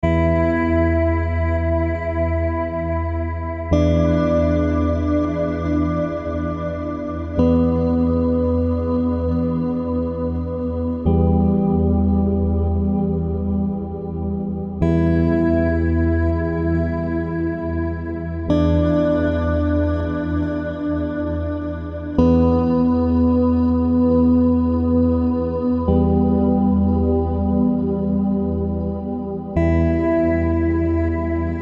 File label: Zen Oasis, ambient soundscapes